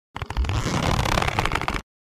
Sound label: Leather baseball movement creak